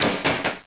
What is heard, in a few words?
no ammo, weapons; NeoForts; neofortsbeta